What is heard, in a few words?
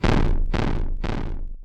earth quake; fowl-play; abilities